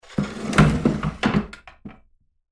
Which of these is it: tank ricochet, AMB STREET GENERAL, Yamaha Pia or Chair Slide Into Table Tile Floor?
Chair Slide Into Table Tile Floor